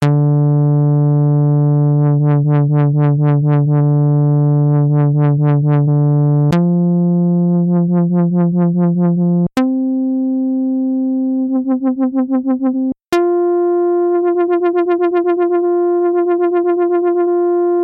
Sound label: Filter LFO Tracked Depth